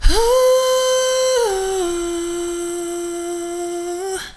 AD LIB, Female Vocals; PlatinumPhattCD2; E-MU Producer Series Vol. 8 – Platinum Phatt

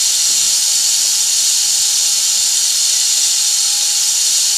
steam, Tea Party; SFX

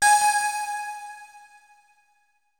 SYN BELL; AKAI S-Series CD-ROM Sound Library VOL-5; FX SYNTH BEL

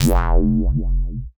stab future, stabs

